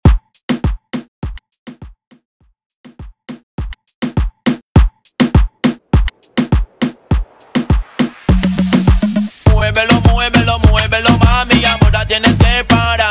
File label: Intro Break 102 BPM